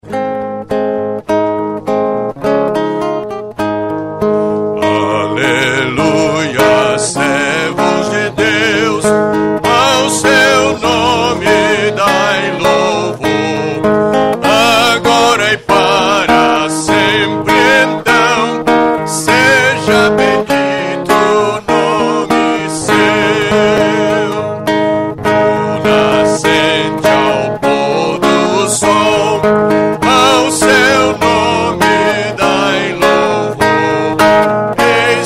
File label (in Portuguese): salmo cantado